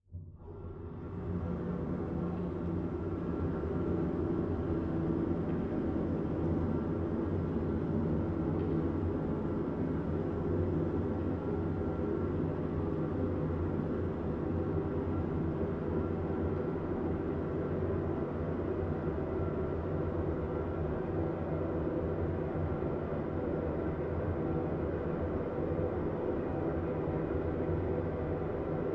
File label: Liquid Water Flowing Through Pipe